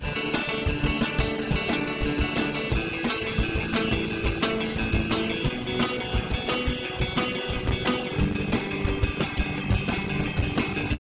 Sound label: groove soft